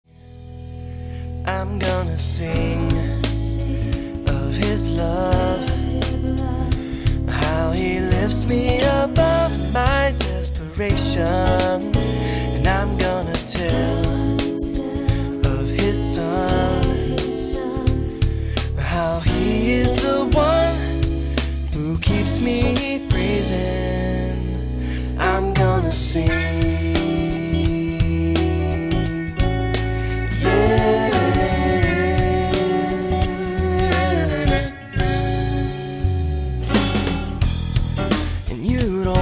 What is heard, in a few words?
Choir Of Two